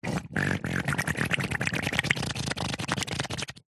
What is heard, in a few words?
cartoon slime bubbles; zapsplat